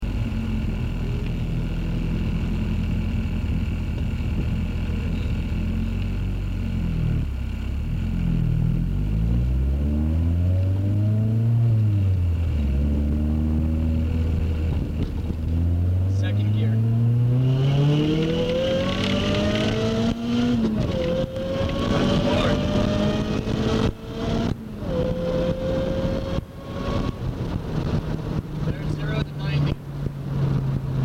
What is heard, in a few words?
2nd gear to 90mph WOT